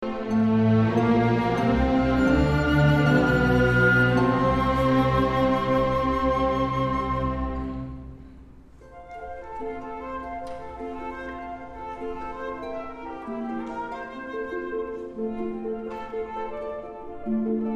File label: Mozart Flute Harp, 2nd Mvmt; Mozart Flute and Harp Concerto Cadenza to Movement #2; Tulare County Symphony, April, 2006. Fox Theatre.